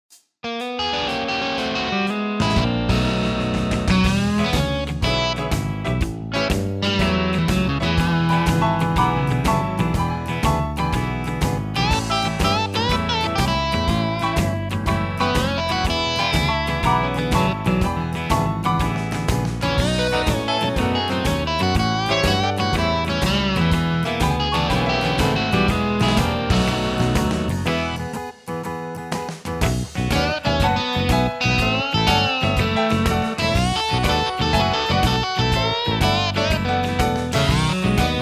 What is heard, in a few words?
Blues Shuffle